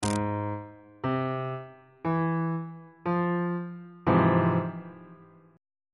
e sharp minor major 7th 1st inversion on bass clef